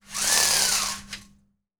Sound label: BLIND; AKAI S-Series CD-ROM Sound Library VOL-6